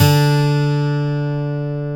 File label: 12 STRING D3; AKAI S-Series CD-ROM Sound Library VOL-8